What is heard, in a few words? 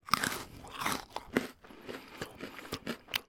human eating malteaser